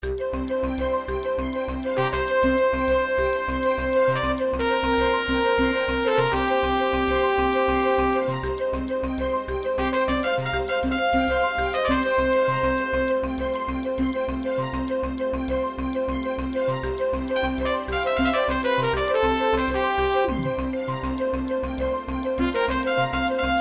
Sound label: jazz techno